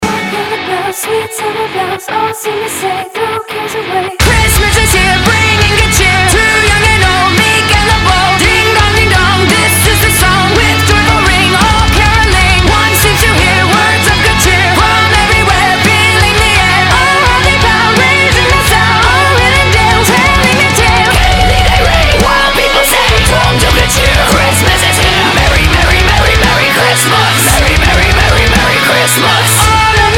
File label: Carol of the Bells Metal